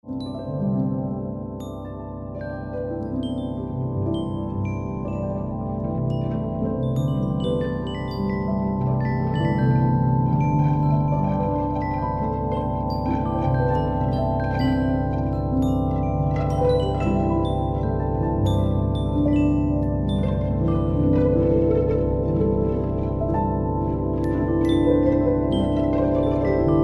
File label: galactic sonification